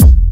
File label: kick, ragga dub reggae; USB Soundscan vol.27; AKAI